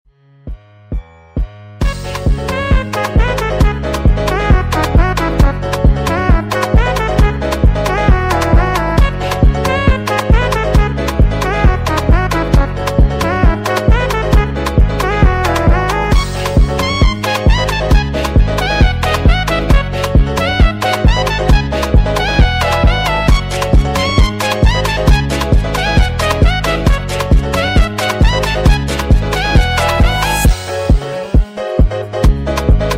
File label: Chess Type Beat Loop